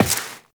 Step Default, Footsteps, Walk; Warframe; Tenno

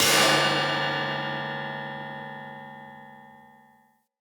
Light Mirror Hit Negative D; Puzzles

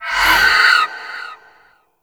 Void Meter Full; Weapons; Warframe; Zariman

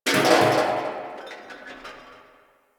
Garbage Can Rattle; Warframe; Ambience; Nineteen Ninety Nine

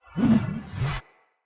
Great Sword Pre Whoosh; Weapons; Swords; Dendrit Ara Great Sword; Accents

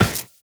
Run Glass, Footsteps; Warframe; Tenno

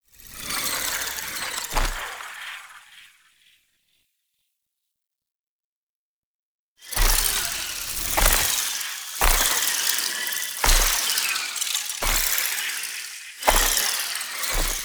Foley; Warframe; Tau; Cinematics; AdisHeal

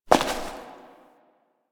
Step Ice; Warframe; Koumei

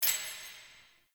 Highlight; Hell reward; UI; Warframe; Tau Mission Menu

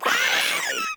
Maggot Bark; Enemies; Vocals; Warframe

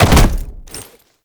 Land Heavy Default; Movement; Warframe; Uriel

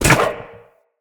Zipline Brake Foley Contact; Gadgets; Parkour Zipline

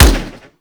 Fire Shot, Weapons; Warframe; Burston

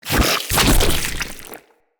Root Spider Elite Web Shot Cast; Animals; Spiders; Abilities

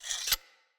dagger unequip; Weapons; Enemy; Daggers; Sinecure Dagger

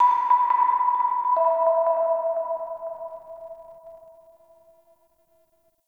Clan Dojo Mallet; Warframe; Dojo Randoms; Ambience

